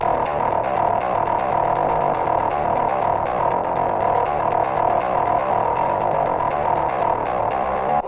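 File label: wet bass, Multiband neural audio effect; prism distortion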